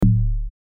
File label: Bass Samples Deep House